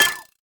gatling gun; LoL; corki

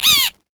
ravens caw; odin

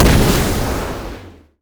inferno bomb; LoL; ziggs poolparty

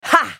spell cast effort, en US; Caitlyn; Character Voices - English

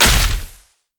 basicattack; LoL; varus

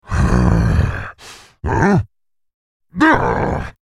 Maokai laugh

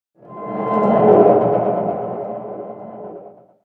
big chains groan

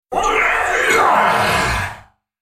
Droid spellcast effort; Khazix; Character Voices; English

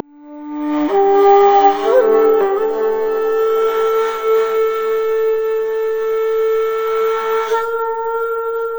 shakuhachi dance loop; LoL; yasuo base

